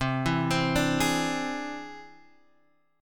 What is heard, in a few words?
E Augmented 7th C, guitar